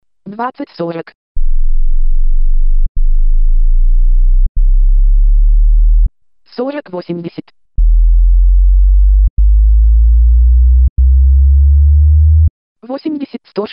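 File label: sweep 20 third-octave; Magnitola Avtozvuk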